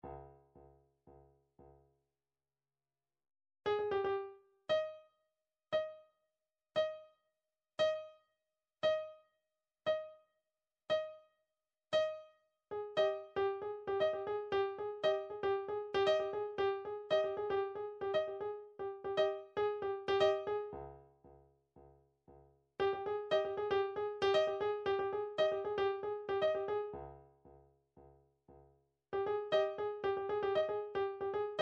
drum trax per

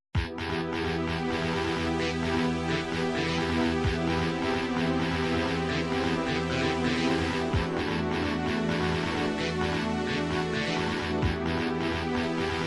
title screen techno remix; nintendo 64 killer instinct gold

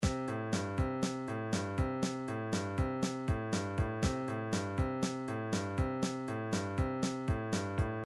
drums motown

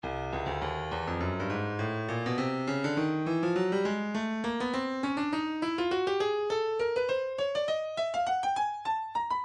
breakbeat midi, 102 bpm; cruz a; Stylus Breakbeat Midi Files

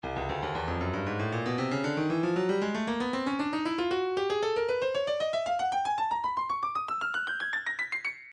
breakbeat 116 bpm; Tikaboom B; Stylus Breakbeat Midi Files

